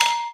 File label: menu button; Geschicklichkeit; AquaBubble2